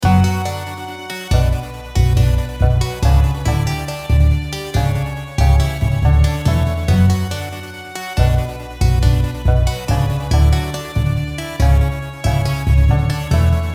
Harmony

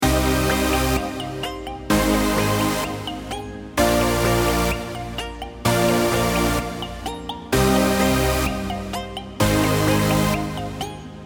Drop Harmony

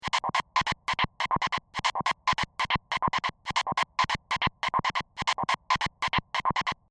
Gated Synth

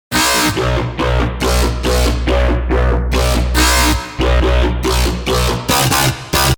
Second Drop Basses

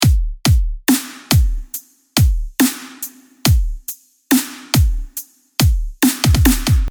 Drop Drums

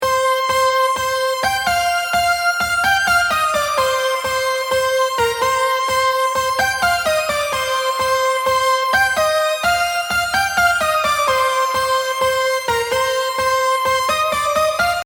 Drop Melody

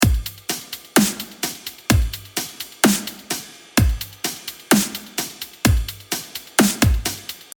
Break Drus

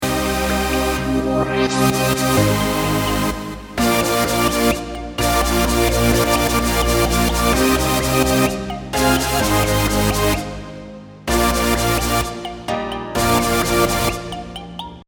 Second Drop Harmony

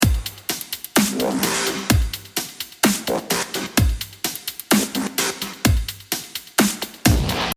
Drop Drum Bass

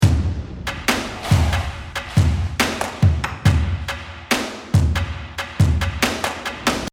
Orchestral Kit

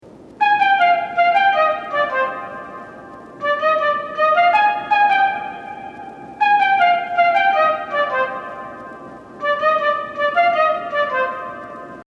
Flute

